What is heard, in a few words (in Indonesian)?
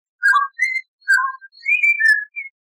poksai enting